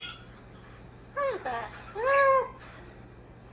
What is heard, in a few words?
Hi, parrot; Baxter